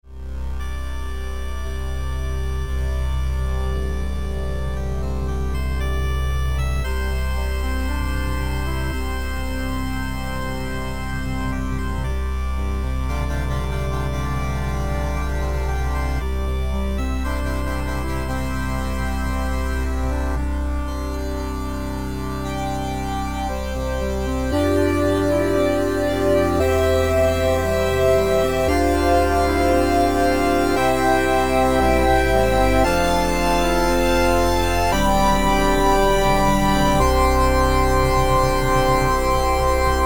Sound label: 8bit